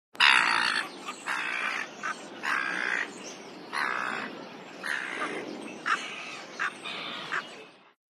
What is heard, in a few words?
Seagull Squawking; birds